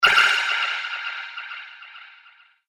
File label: Illusion Echo Spell; Magic Sound Effects; Role Playing Game